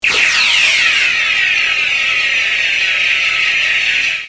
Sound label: phaser clean